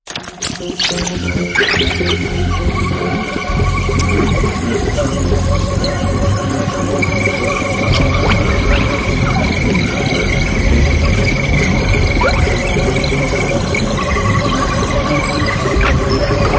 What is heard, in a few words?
Electronica noise; bruitiste